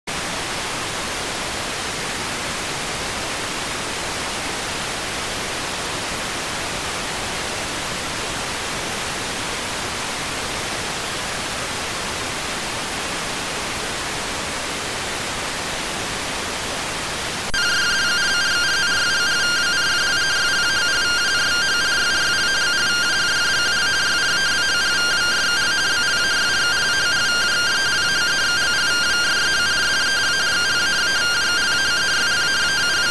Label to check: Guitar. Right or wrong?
Wrong